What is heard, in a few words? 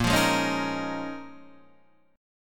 Bb Minor 11th Bb down Guitar DADGAD